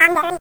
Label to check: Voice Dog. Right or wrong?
Right